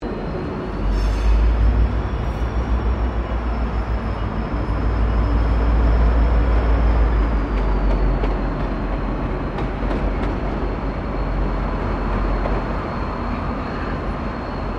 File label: Train Locomotive Passing; VICRAIL; Various Melbourne Trains; Vehicles